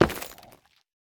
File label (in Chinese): 下界矿石踩踏声